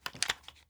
movement smg; gmod; arccw uc commons attachments

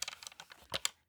movement rifle; gmod; arccw uc commons attachments

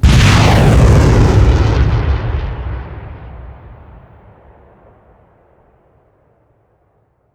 trail, rocket; gmod; arccw uc commons attachments

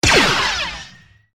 fire; gmod; lvs star wars; vulturedroid

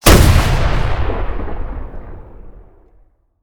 fire, rocket; gmod; arccw uc commons attachments

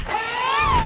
hydraulic

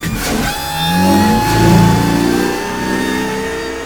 engine on; gmod; lvs barc speeder test

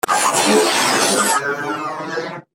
kick; tridroidznnpc

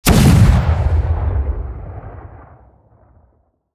fire dist, rocket; gmod; arccw uc commons attachments

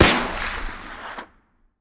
fire dist; gmod; arccw uc commons attachments

